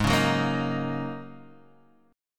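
A flat 7th Suspended 2nd Suspended 4th A flat Guitar Half Step Down, chord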